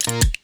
switch flipped; BB 2HTC Samples Vol 4

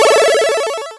Retro Power UP

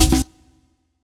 Error Action; FREE SFX PACK VOL 2

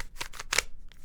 Big Cube - Twist, Rubik's Cube

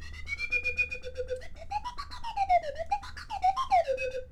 Random Stabs, Slide Whistle

